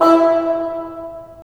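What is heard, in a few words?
wind bassoon; LA Composer vol. 3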